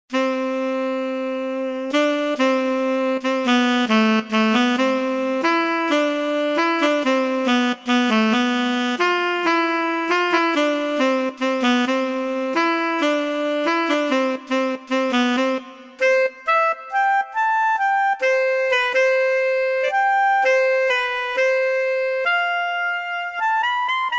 Tenor Triton

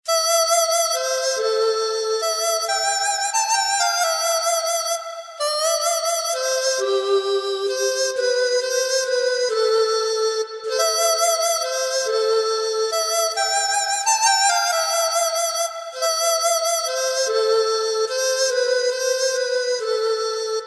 Irish Dizi

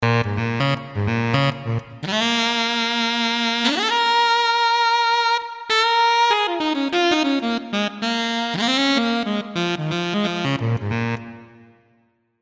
ALTO

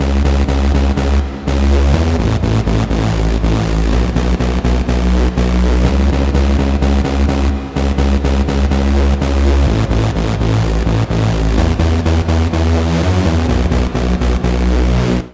BASS DEEP

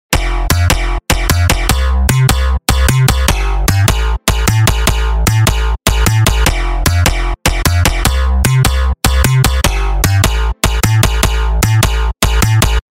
Bass EDM Kombi

